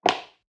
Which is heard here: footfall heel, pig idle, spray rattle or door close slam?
footfall heel